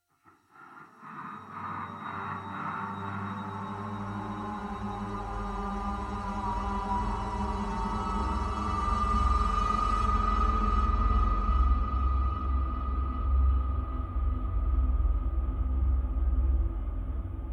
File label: mood; inFamous; PS3